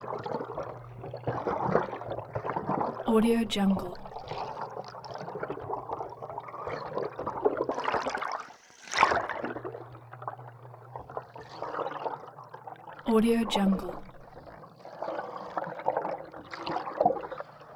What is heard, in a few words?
underwater movement splashing churning swirling